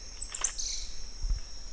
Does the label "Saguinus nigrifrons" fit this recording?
Yes